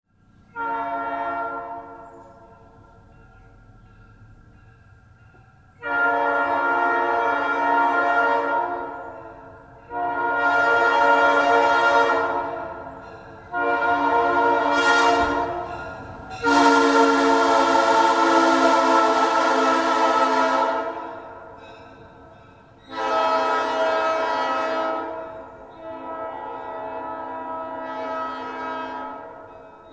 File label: horn; P3R2